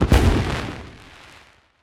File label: firework; teardown; ps5 windows xbox series xs